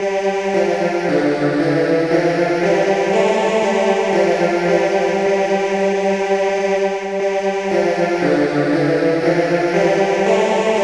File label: chant